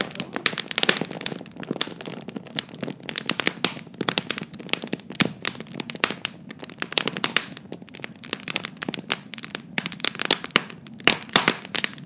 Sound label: a lovely roaring fire; for the autumn